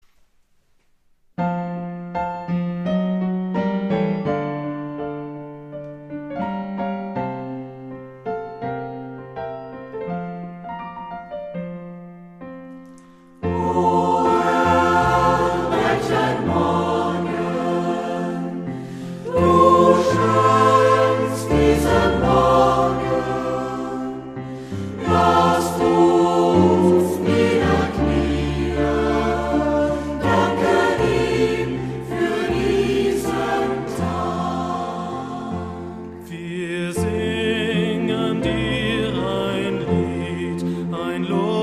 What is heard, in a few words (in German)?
Evangelischer Kirchenchor; Lebenswege; Groebming